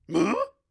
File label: floor fall; Zote; Hollow Knight